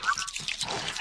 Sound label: infector idle; flood